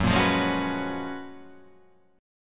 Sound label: F sharp Major 11th